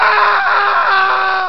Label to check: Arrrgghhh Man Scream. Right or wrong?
Right